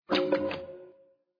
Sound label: match wrong; fairies